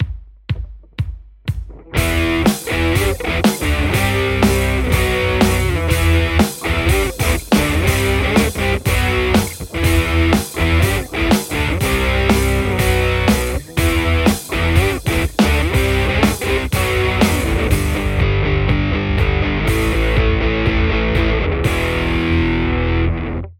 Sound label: lespaul; overloud th3